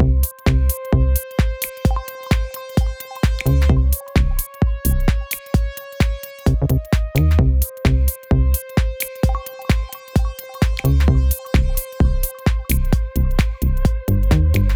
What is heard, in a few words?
Tiny Loop